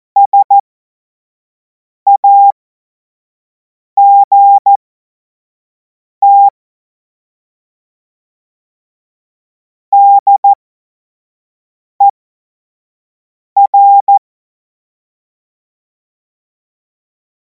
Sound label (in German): Witz, Morsekurs, 25 F70; cw-morsen